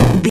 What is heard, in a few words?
retro impact hit general